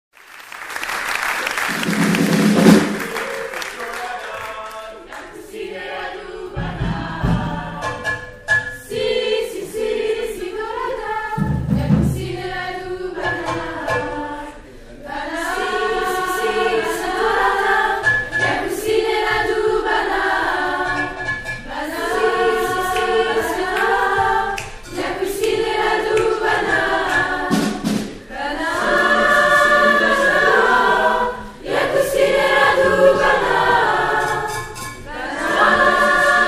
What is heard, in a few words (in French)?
canon congolais concert; live au vox